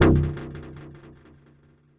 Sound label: FM Bark, bass; Ableton; Punch and Tilt